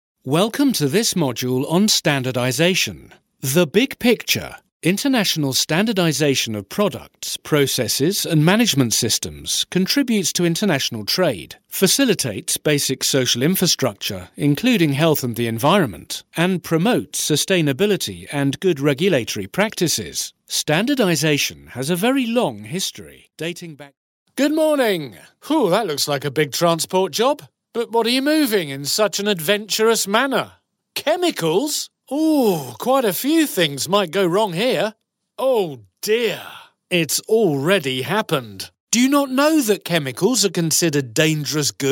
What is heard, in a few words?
sung radio ads